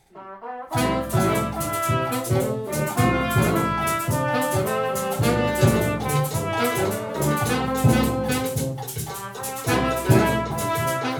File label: Conga